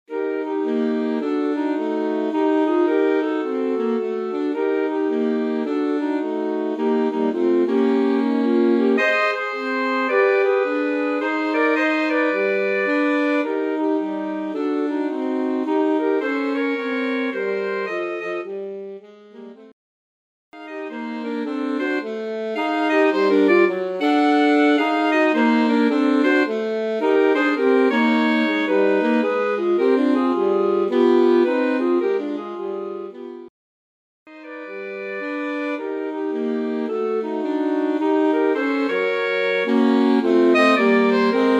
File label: blues